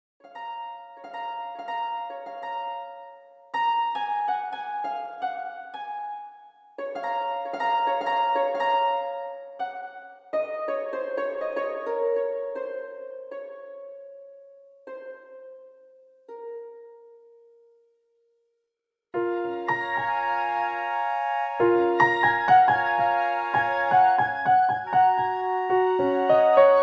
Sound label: song reeds